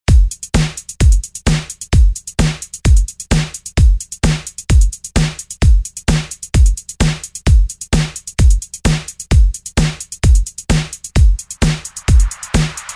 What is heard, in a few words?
90s remix, 130bpm